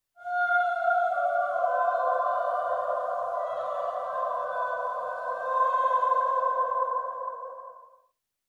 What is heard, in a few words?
Stripped Sting